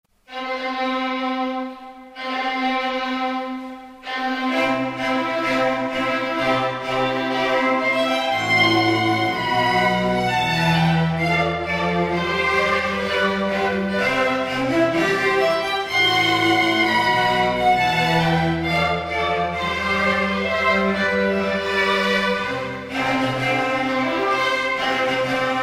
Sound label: String Ensemble; Marcia nuziale - F. Mendelssohn; String Quintet; italian; wedding